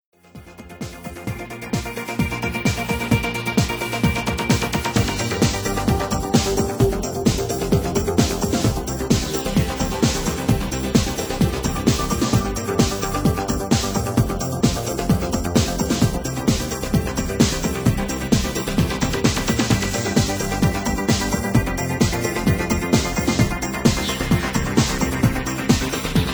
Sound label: Pure trance mix